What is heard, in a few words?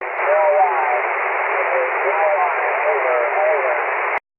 ssb, 23cm